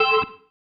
Stab, Organ, One Shots

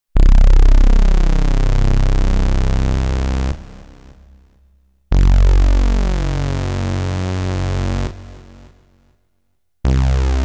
dance & techno, lead; Inspiration Zone

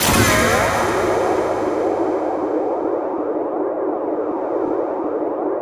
HYDRA BLAST; Distored Reality

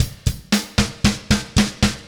Beat Fill, Indie Rock; Sonic Foundry (Sony Creative Software); Indie Rock Anthems; Session 06 - Haze

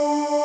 kawai k1 VOICE; wavetables

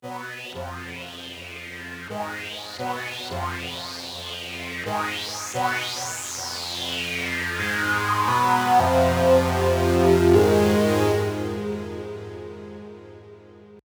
Melodics; neuro; Optiv & BTK

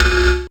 METAL HIT, HAMMER PERC; Zero-G Ambient 1

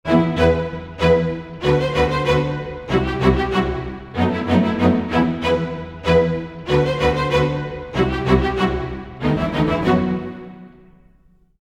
95 e min strings theme, Orchestral arrangements

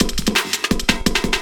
LOOP, 170 BPM

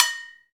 Fijidera; Club-50 - Foundations Roland; Agogos

